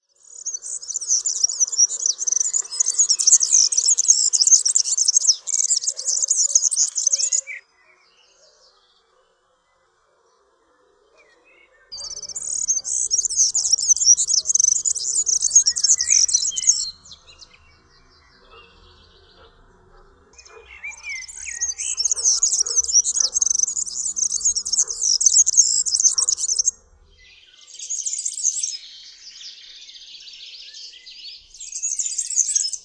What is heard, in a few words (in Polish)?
KULCZYK, birdsounds